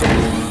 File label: Turet